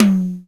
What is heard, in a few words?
Tom Drum Single Hit F# Key; tom drum samples; one shots